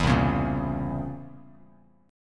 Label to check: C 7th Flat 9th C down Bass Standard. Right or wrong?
Right